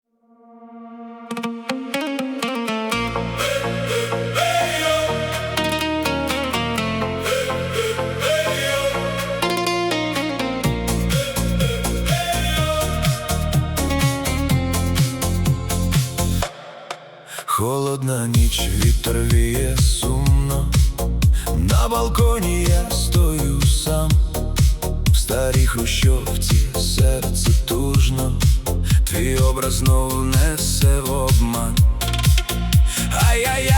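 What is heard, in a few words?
Ukrainian music for the car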